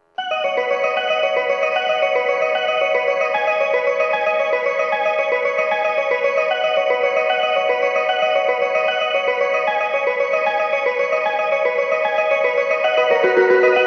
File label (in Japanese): 発車メロディー 1.9コーラスです!下り普通列車の発車です。